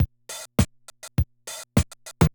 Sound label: Loop, classic machines, rpm 40